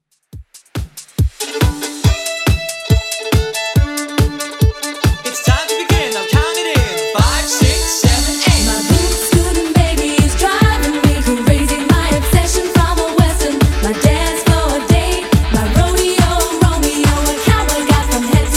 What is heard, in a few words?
90s Redrum 140bpm